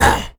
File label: deathmetal hit; Crypt of the NecroDancer